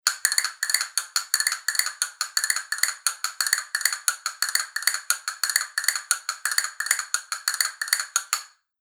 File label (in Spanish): castañuelas madera roja veteada